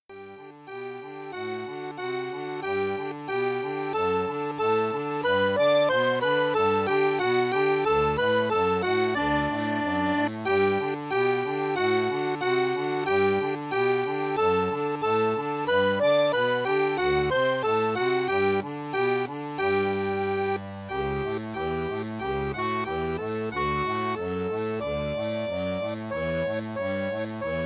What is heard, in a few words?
Czerny arpeggio; Carl Czerny; Scuola dei Bassi - Arpeggi; in 4-4